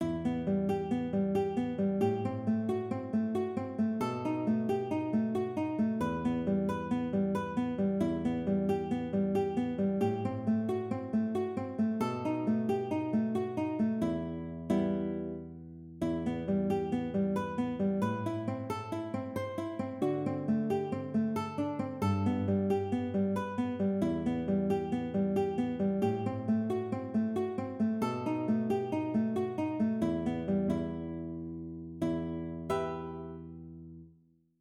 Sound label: Estudio, konzertgitarre; Tarrega